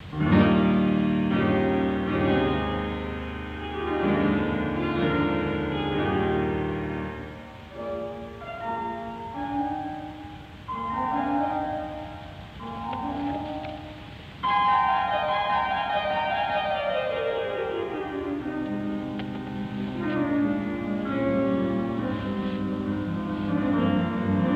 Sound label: Harpsichord and Piano